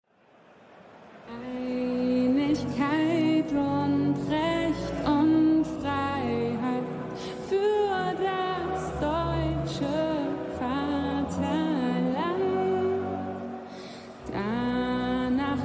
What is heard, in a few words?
German National Anthem